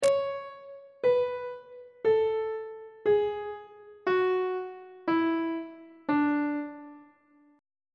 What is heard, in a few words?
d lydian mode descending on treble clef